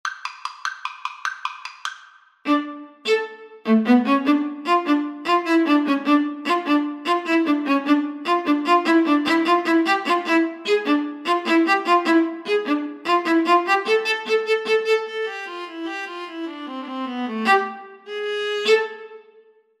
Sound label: Viola Cello Duet